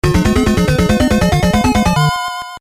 fanfare; ninja gaiden

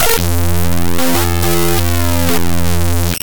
laser; discord icon raw data

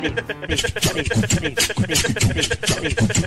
hehehe

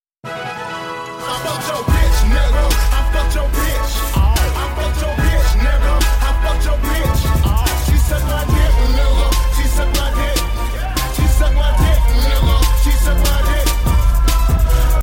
victory

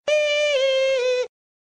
iiiiiih

